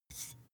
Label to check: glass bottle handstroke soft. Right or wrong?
Right